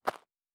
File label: Dirt Run Surface, Footsteps